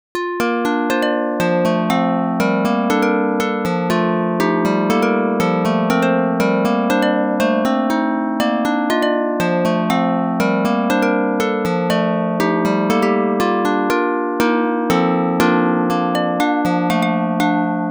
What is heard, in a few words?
sitar